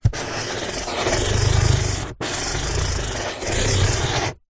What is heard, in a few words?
office pen felt tip strokes on empty cardboard box